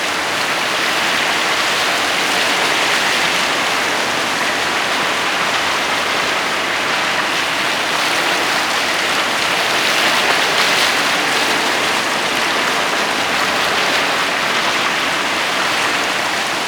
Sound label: Water Amb Loop; moul